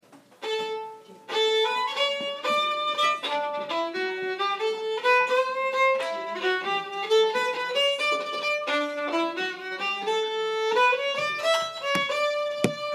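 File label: Northumbrian jig; The Fiery Clock Face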